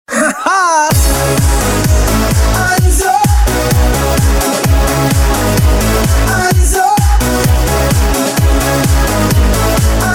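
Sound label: jingle; Otbivka komandy KVN; отбивки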